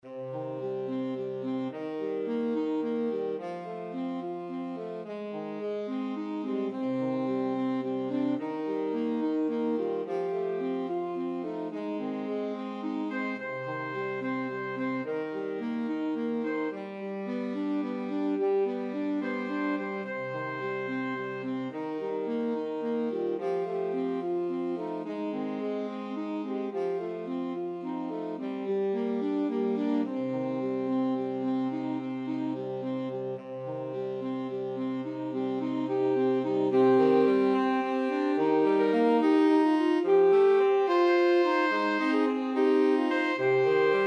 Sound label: house of the rising sun, 2 alto tenor sax